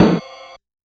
Rhythm Snare + Ride, Realistic Concertmate-670; Layered Kit

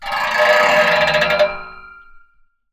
Percussive Hit, contact mic

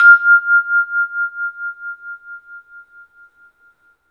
bell; 3D Sound; 3D Keys VOL-1

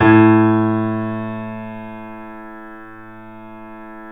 A, Piano; InVision Interactive - The Piano

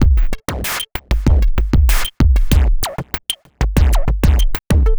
Drum Loop, Drum Loops

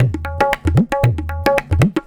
Tabla

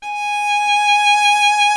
VIOLIN G#5, SOLO STRINGS; Greytsounds VOL-1

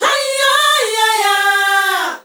ZULU CHR, FEM. CHOIR; Spectrasonics; Heart of Africa 2

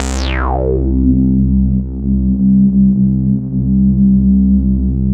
FAT RESO, FAT FUNK; CMP - Analogon

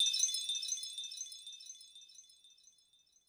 Korg 01W Percussion

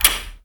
on off, Switch FX; Masterbits

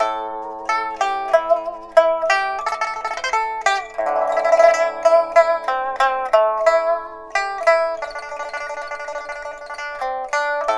PIPA, 90; INIS - Opium

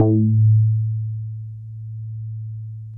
ROLAND JX8P, Vintage Keyboards, A2; Big Fish Audio - First Call Vintage Keyboards

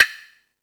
Percussion FX, Drum Machines

